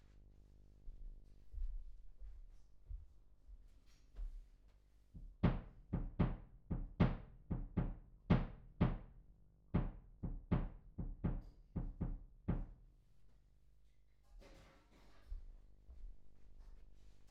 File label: Voc Fig8